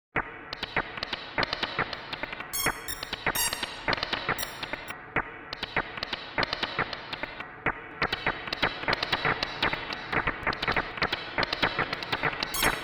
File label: Blip